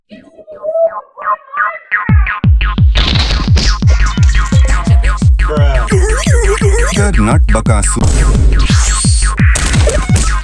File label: Fast Boop Bing Bop Type Beat with memes